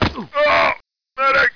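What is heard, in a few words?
bullet hit flesh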